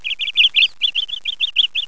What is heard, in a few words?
bird